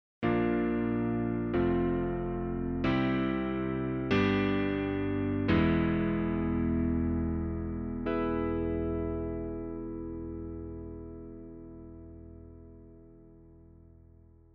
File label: Classic Keys